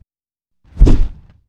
warbeast attack

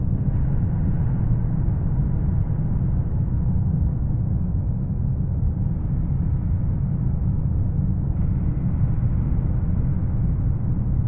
dry, ambience

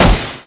Mace Metal, Damage

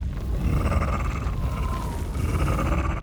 PEACE WALK, Mob

